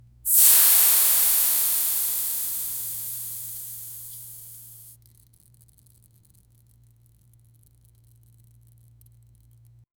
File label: a low rich liquid pour